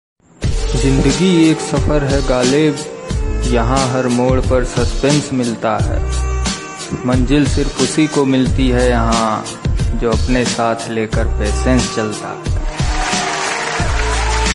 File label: sad hindi